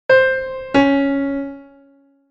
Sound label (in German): Intervalle sukzessiv